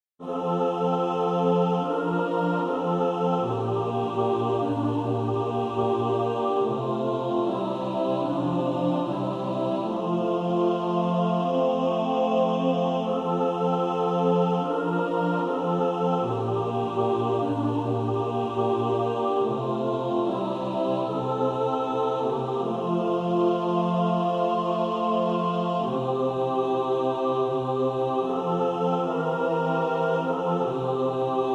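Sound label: mixed, practice music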